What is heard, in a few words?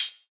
good pedal hi hat one shot B key; hat samples